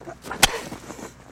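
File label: Dig Single Exterior, shovel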